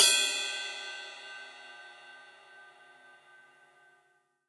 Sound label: Ride Layered; Drums